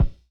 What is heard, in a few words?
Hammer Muted, Kicks; Airwindows